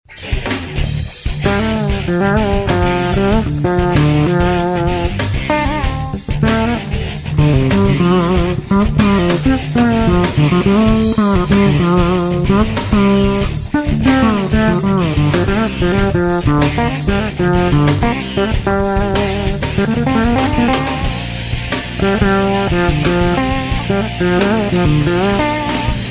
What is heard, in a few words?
solo bass jam